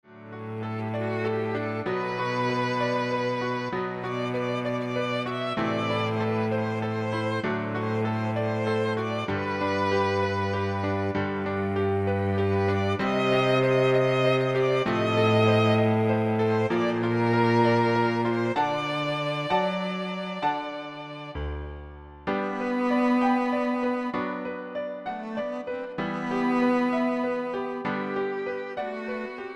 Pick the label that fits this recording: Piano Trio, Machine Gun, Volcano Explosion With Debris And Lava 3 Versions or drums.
Piano Trio